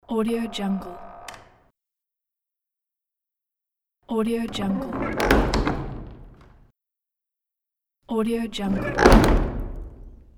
old wooden door open close pack

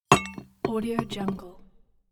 bottle bouncing on table; moonaudioproduction

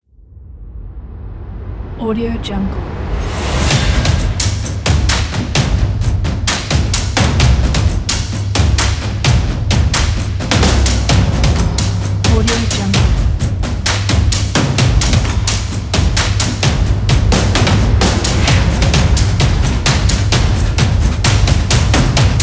epic percussion trailer; cleanmagicaudio

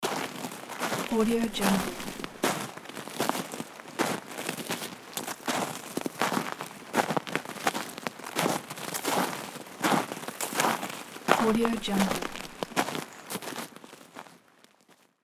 walking in the snow and rain

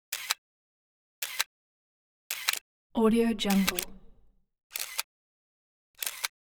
camera shutter motorized 35mm shutters

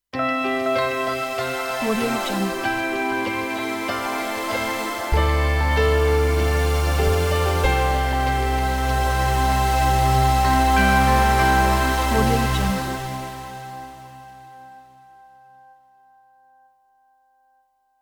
natures beauty ident; simonleng

